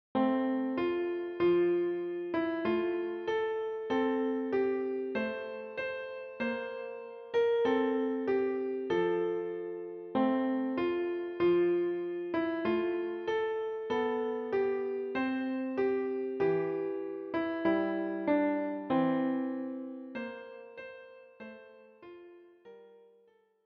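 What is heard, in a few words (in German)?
Klavier; Hark The herald angels sing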